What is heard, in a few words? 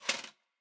armor heavy; Smite; god Lancelot